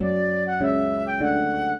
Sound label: minuet, flute harp; Mozart